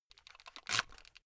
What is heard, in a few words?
headphone retract unsteady